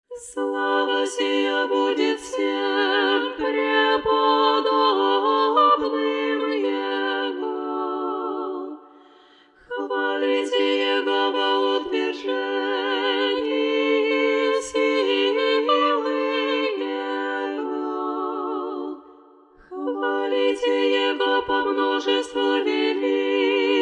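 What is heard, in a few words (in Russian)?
zapevy na khvalitekh glas 4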